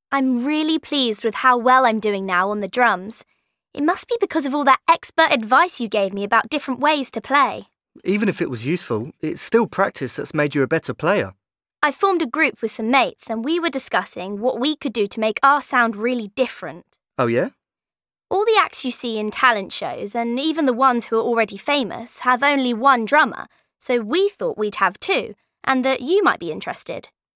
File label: DRUMS